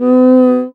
QUICA DOWN, Latin Hand Perc; E-MU Producer Series Vol. 2; More Studio Essentials; Composer

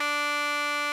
RMI; Sonic Reality - Vintage Timetraveler

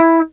DAMP E4; AKAI S-Series CD-ROM Sound Library VOL-2; EL.G

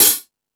Ambient Rock, Drum Machines

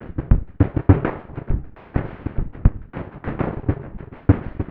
Paper Kit, Drums & Percussion, Electronic; Sonic Foundry (Sony Creative Software) - Electronic Point Blank

